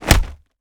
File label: punch wall; gmod; arccw uc commons attachments